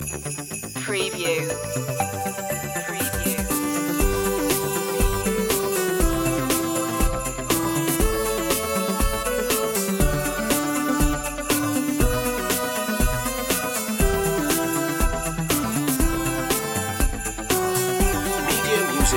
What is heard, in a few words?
merry christmas synthified